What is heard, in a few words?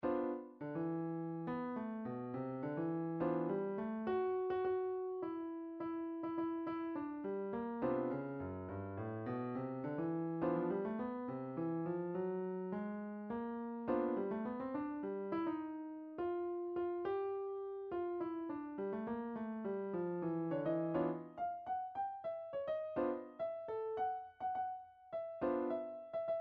ten short piano pieces, midi; shostakovich